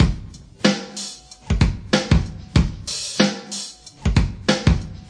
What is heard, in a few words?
professor compressor, drum loops and breaks, 94 bpm; 091 to 100 bpm